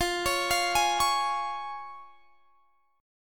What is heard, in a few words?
F Minor Sharp 5th F down; arpeggiated